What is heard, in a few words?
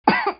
cought